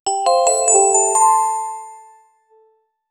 success notification, digital

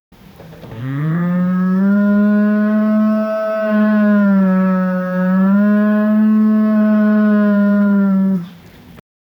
cow mooing; animal call

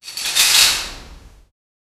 falling curtain curtains

